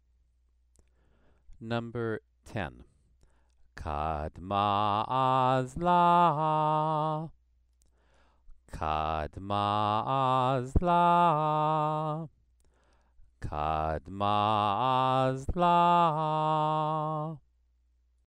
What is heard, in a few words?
Torah trope exercise